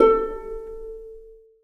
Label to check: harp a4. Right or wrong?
Right